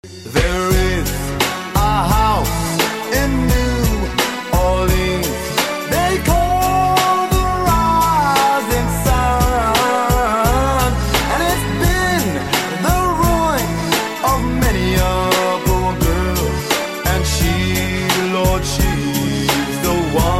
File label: Hip hop